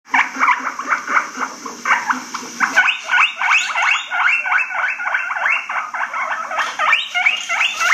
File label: Guinea pig wheeking; happy guinea pigs